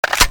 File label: violin gun clip in